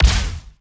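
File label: Laser gun Ballista Fire Short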